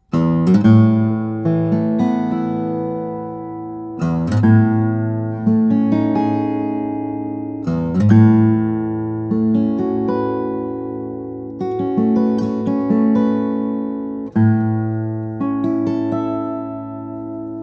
steel string flattop guitar; Applegate SJ cutaway; brazilian rosewood; cedar

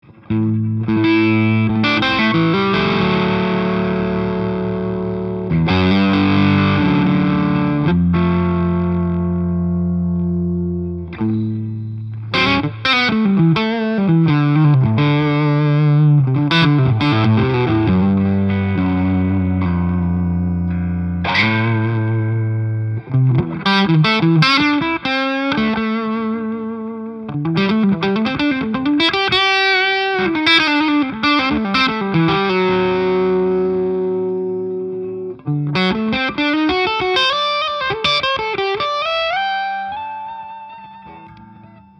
electric guitars, solid body; Gil Yaron 9 Series; mahogany maple

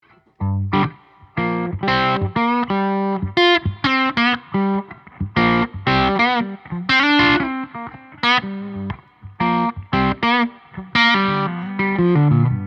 Gibson ES-335, semi hollow electric guitar; custom; 1959 reissue; maple; cherry finish

